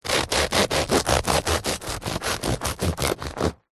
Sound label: food bread loaf crispy paine de casa cut with bread knife